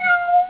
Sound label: meow